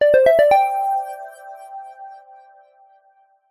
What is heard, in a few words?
completed